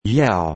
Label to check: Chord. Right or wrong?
Wrong